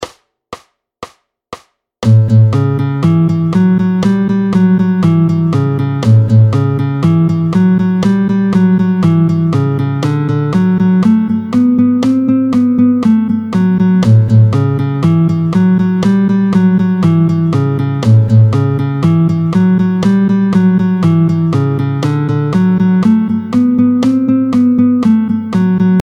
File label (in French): Guitare boogie, tempo 120; 08-18 Guitare boogie. Vite tempo 120; picking